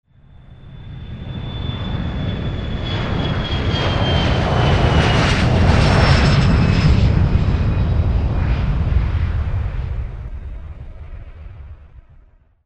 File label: flight